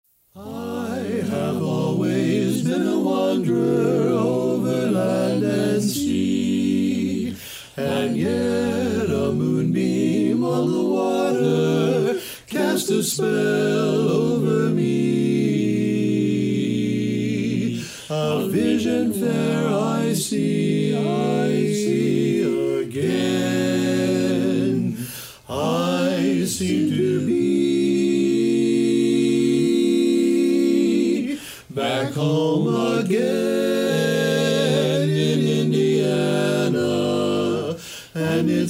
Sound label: barbershop quartet